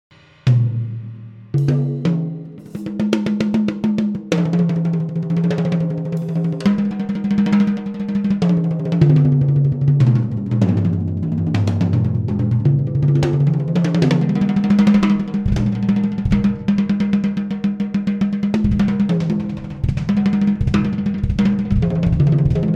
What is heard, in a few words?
drum track; Tama snare; Garcia kit